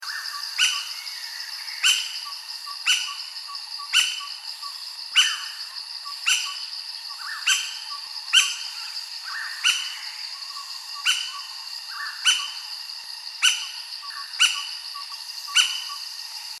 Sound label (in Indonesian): pelatuk gunung